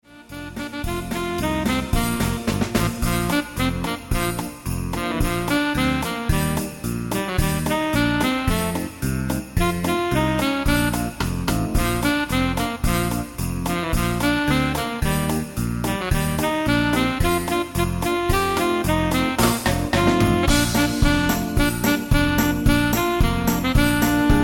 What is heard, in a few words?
Jingle Bells, Sax